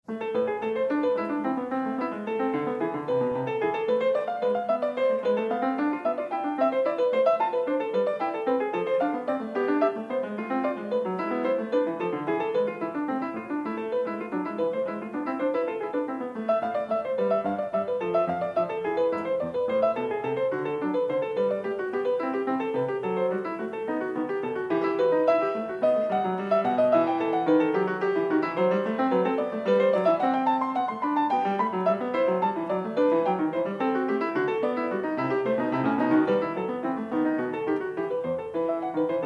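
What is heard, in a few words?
Fantasia, piano; Partita 3 in a; BWV827; Bach; Partitas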